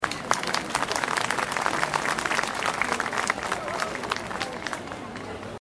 Am thanh vo tay powerpoint

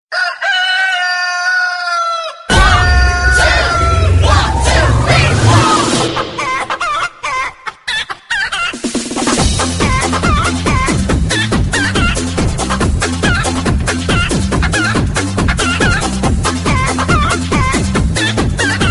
Nhac chuong Ga gay

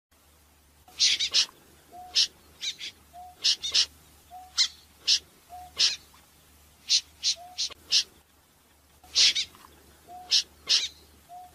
Tieng chim De Giun keu

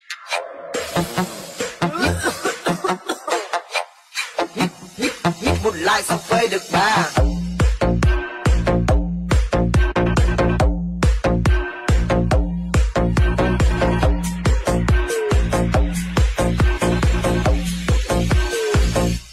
Nhac tieng ho remix; TikTok

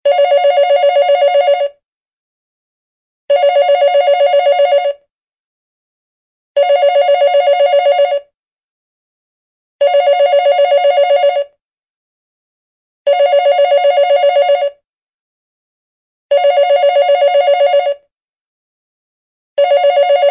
Nhac chuong dien thoai ban van phong

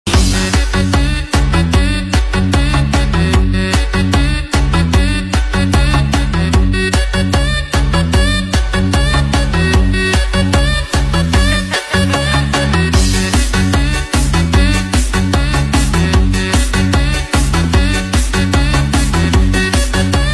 nhac thai lan quay dam cuoi cuc manh remix; tiktok

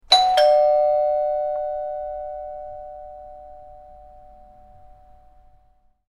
Tieng chuong cua

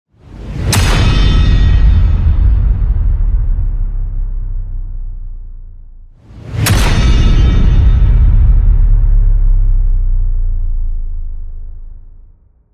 Am thanh mo dau phim

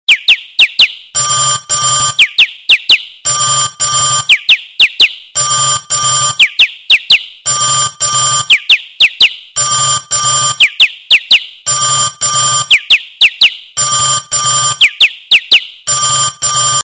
nhac chuong bao thuc chiu chiu reng reng

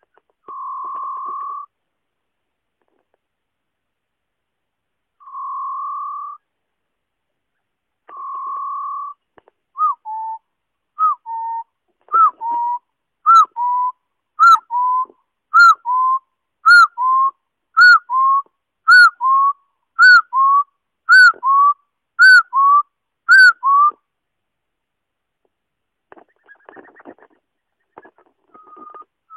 Tieng Ga So Nui

